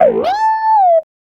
Clavinet; Ambient Grooves

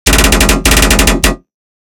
tonal tearout basses BPM150

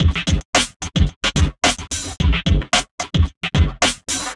Funky Beat, Drum N Bass

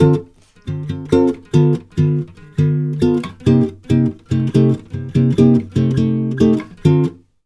CHORDS, Brazil Bossa; USB Soundscan vol.16

